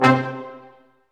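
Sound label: BRS Large Ensemble stac; Roland L-CD702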